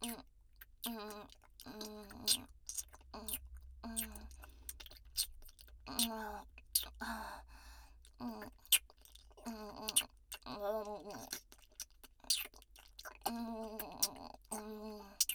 ディープキス音

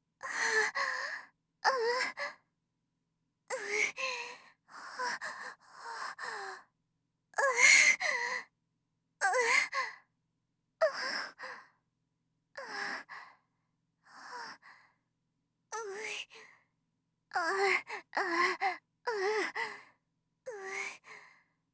喘ぎ声(中)

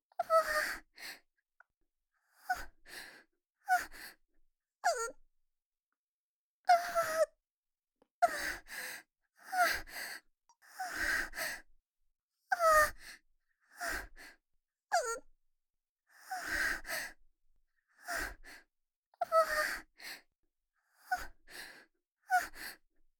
喘ぎ声（小）

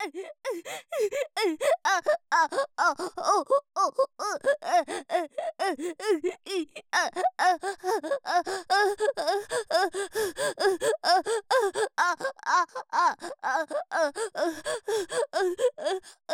喘ぎ声 特大

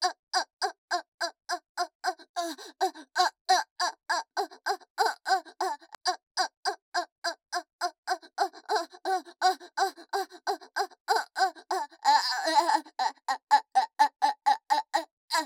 喘ぎ声

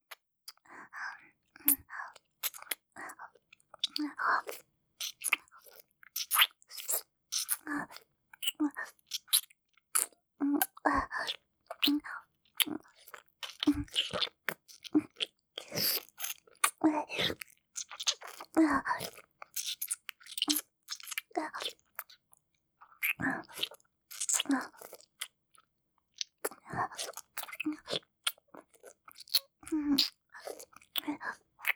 フェラ

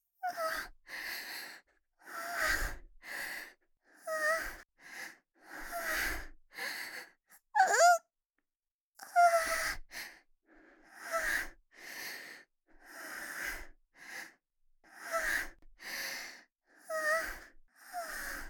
喘ぎ声（小）

